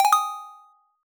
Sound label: health pack; pygame tile